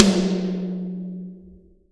TOMS, Real Mega Drums; Best Service; Real Mega Drums VOL-1

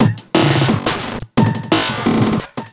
Glitched Beat 175BPM; glitch kit

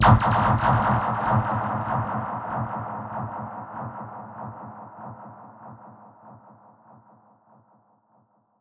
Perc Hit, dub percussion; musicradar

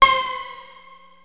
Access Virus B; Synthworx

